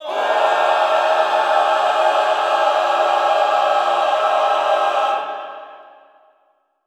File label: Cluster horror, Classical Choir; Best Service; Extended Classical Choir